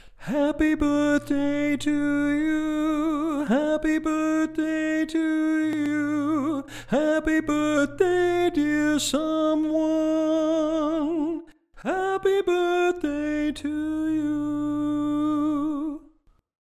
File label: Happy Birthday - Tenor